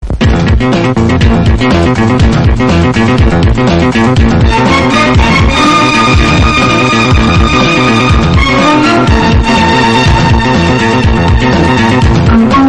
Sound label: instrumental bgm remix; cbi 5 the brain